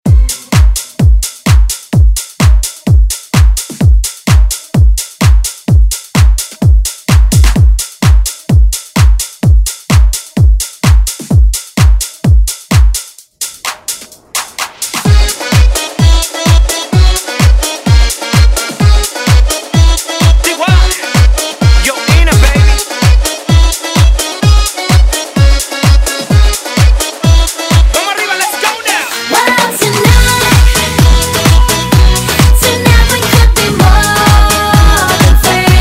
Latin House Redrum

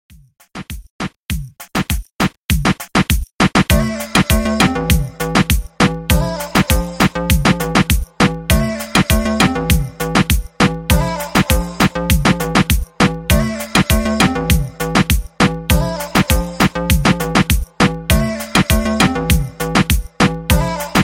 reggaeton 100 bpm

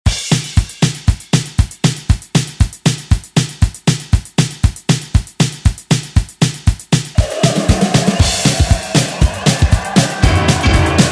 80s ReDrum; DJ Mhark

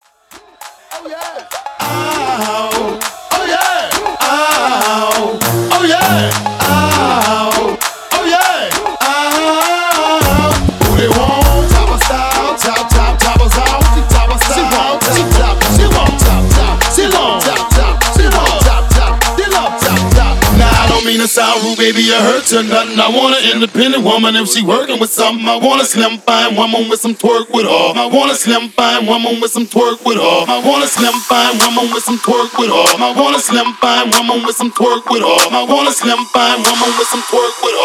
Twerk Acapella Transition 100 95 BPM

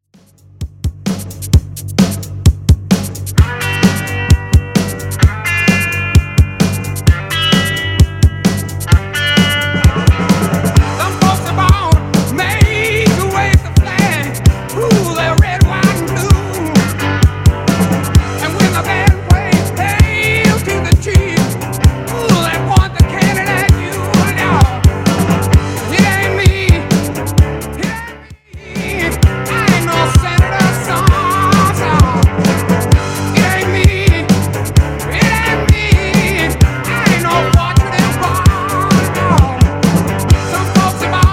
60s Real Drum 130bpm

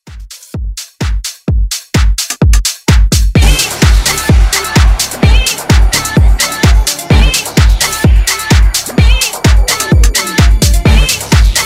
House Rework

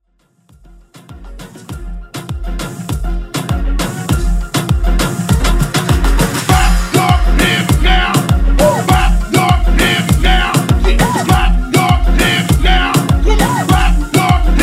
Moombah Hype Edit

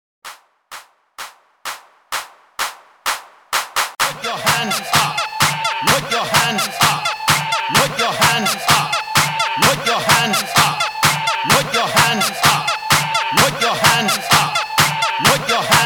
Party Starter 128 BPM

